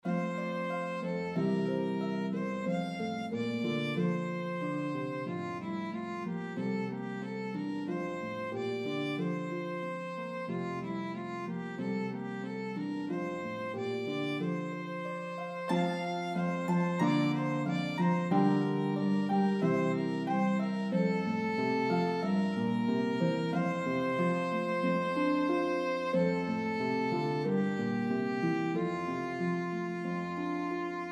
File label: Harp Melody Instrument Violin